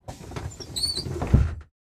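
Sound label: school bus door close; brakes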